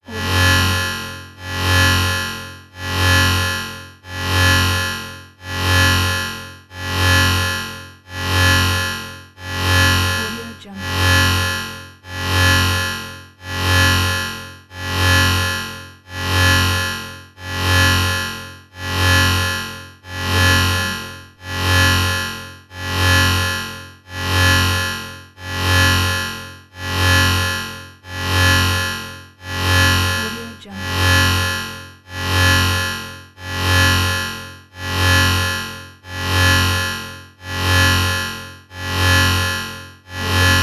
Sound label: the alarm